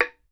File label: BAMBOO, World Assortment